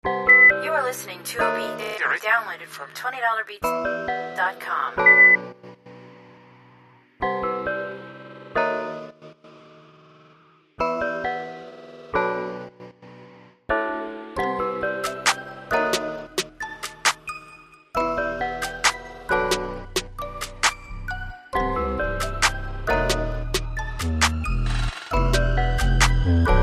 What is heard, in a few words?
RnB